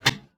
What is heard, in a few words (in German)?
tuer auf, volvo 9900; Vehicles; AI volvo 9900; Freyfurt